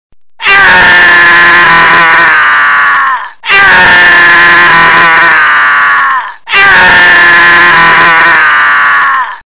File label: Aaaaah; Very-Funny-Ringtones